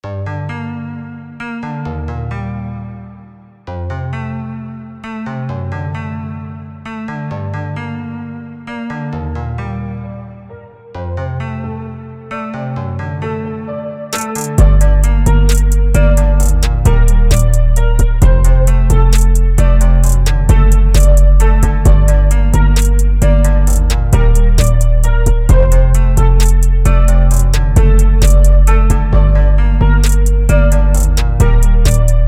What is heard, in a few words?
beat